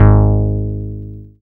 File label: BAS MKS-50, Roland